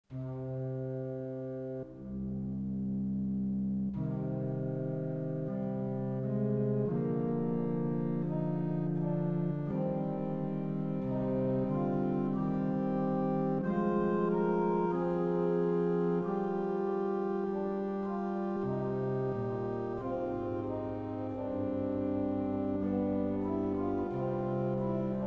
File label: salicio 8, organ